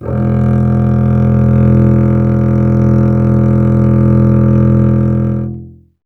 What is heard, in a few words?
STR CBS, Solo Instruments